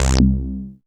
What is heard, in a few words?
BASS, Analogon